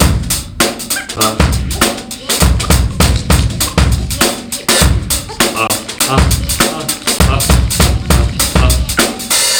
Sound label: Drum Crazy, Breaks; Zero-G; Beneath The Planet of The Breaks